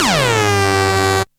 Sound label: FX